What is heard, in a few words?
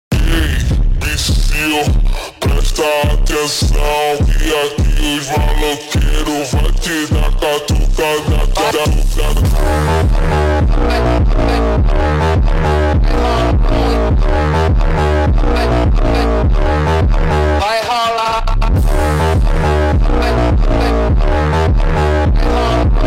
Brazilian Phonk